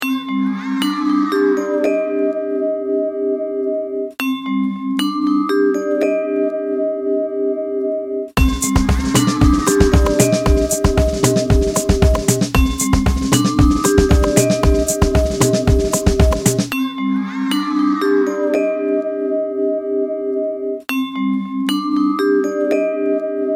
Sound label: beat; moh samples